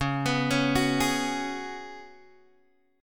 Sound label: C sharp 7th Suspended 4th C sharp, Guitar Open G